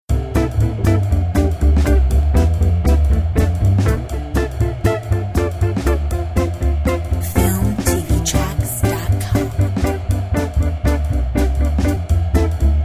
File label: Swing